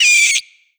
pain, headcrab, creatures; headcrab classic; vox

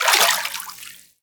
fs fm water, footsteps; player; Portal 2

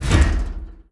tick, carousel; hinges drawers

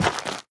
step light, footsteps, gravel

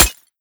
glass, bullet impacts; Half Life Model Pack

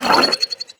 run grunt, headcrab reviver; creatures; vox

